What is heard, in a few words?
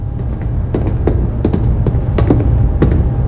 Walking Deck; trek